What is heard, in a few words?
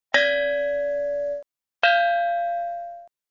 bell